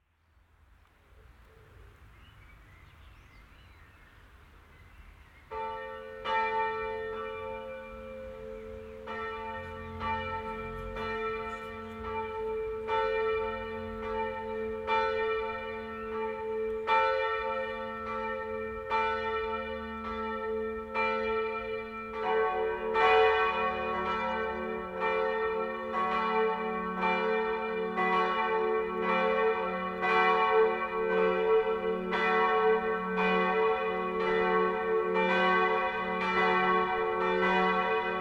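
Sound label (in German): Geläut d e fis a; Bad Schwartau-Rensefeld; ev. St. Fabian und Sebastian; neues Gelaeut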